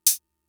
Open Hat, Drum Machines MFB-522; Fricke

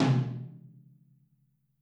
Drums Percussion Kit1 Room Mic

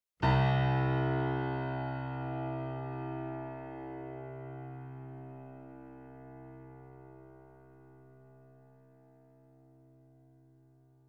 C2 E2 A2 Grand Piano & Sample Piano; Sound Sampling Collection VOL-3; Grand Piano 88

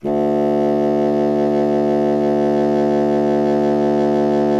C Bassoon; Miroslav Vitous - Solo Instruments VOL-1

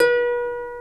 HARP; Greytsounds; HARP+STEAMER

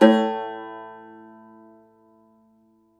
cumbus saz A1, plucked strings; E-MU Producer Series Vol. 7 – Old World Instruments

